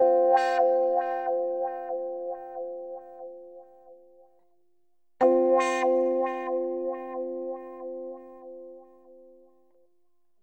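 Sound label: guitar, Ab, Hip Hop & RnB; Tantrum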